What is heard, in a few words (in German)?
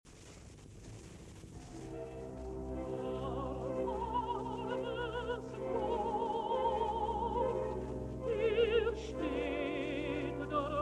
rezitativ; Bach, Johann Sebastian; Matthäus Passion; erbarm es gott